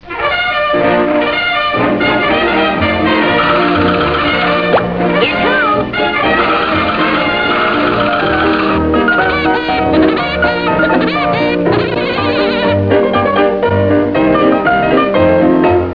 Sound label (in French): Sonnerie; Woody Woodpecker